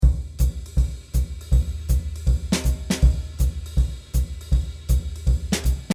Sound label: Bebop 160